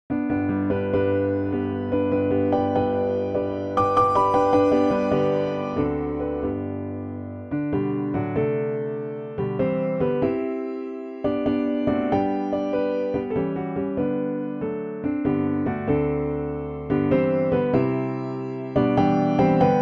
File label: mendelssohn morning song op62